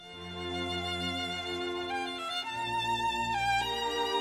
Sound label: violin